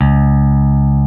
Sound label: ELECT BASSE, FINGER BS